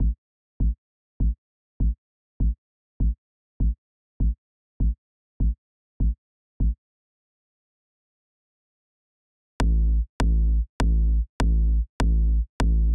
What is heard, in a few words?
Big Kick A